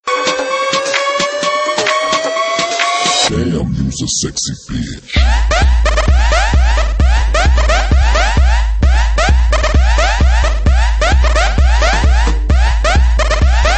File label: Techno